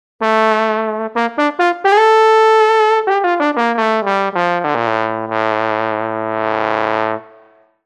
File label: Trombone